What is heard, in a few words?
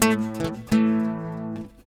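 acoustic guitar, ethno, 125, A; eJay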